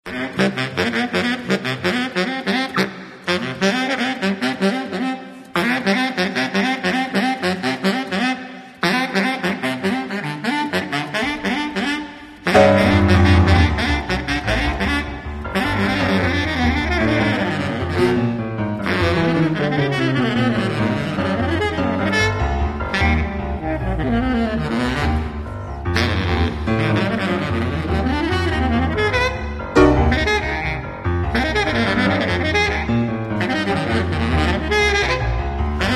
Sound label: piano studio